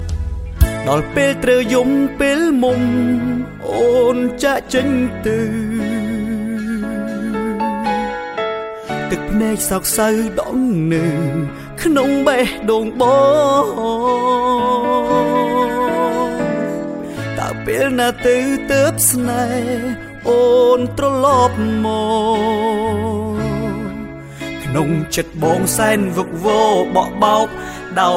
Khmer Song

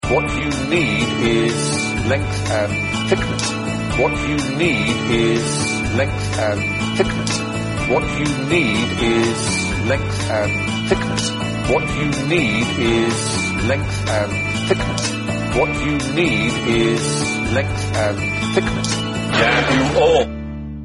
Chimes

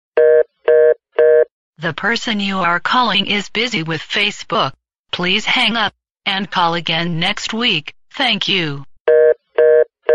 Busy Tone; F Book

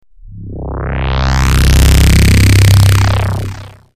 Alert Tone

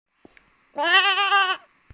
sheep

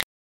Flashkey tick

